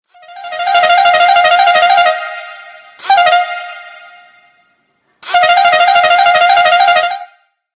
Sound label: Fiamm Tour low, 12v; 12v tour de france